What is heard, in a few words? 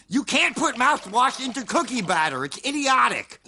mouthwash